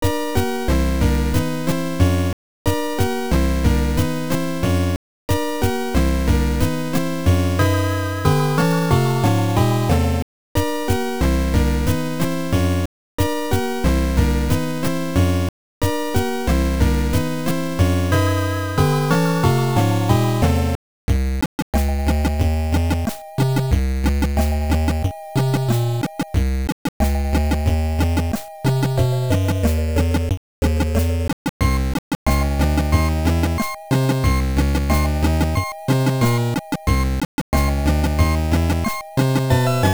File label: Enemy's Victory; military madness turbografx 16